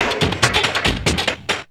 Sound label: Beat, Industrial